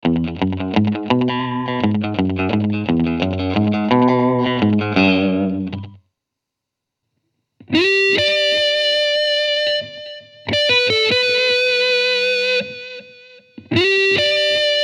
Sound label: time fx